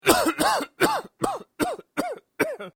Coughing Male Cough; human sound effects; Sick Sound Effects

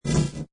Sweeper Dark Warble Data Element Pan; Scifi Production Elements, Set 2

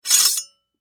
Sword Sheath Remove; Warfare; Combat

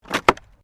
WOOD PLANK TWIST

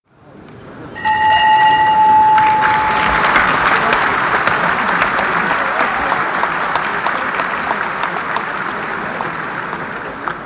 End of round, bell & applause; Crowd; BBC

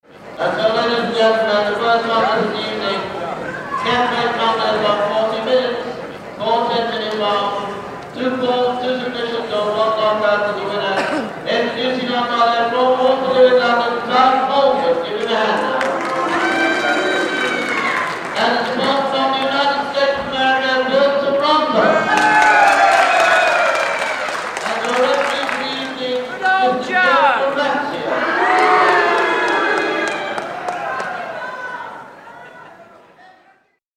PA announcement & crowd reaction; Crowds; BBC

